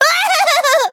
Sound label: hit; Cookie Run